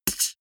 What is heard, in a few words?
beatbox snare damped